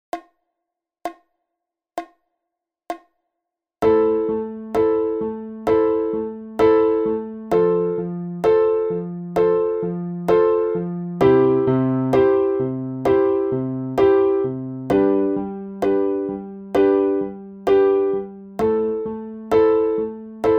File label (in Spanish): Acompanamiento Pop